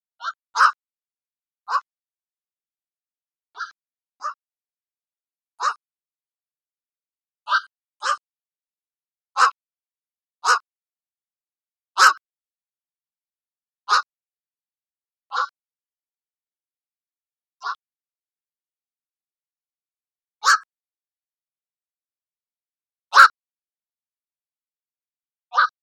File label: Black-crowned Night-Heron; With a range that covers most of the world, this peculiar heron is quite successful. It can be found in marshy areas, swamps, pond edges, lakes, mangroves; Ask A Biologist